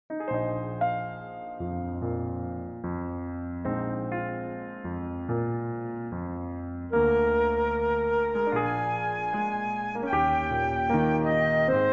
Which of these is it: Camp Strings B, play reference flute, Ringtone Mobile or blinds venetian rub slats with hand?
play reference flute